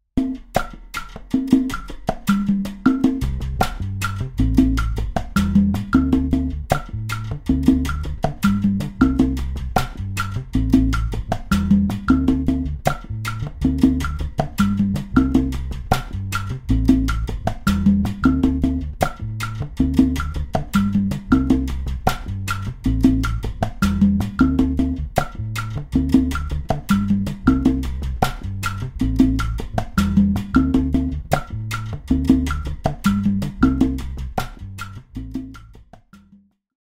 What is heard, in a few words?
Guaracha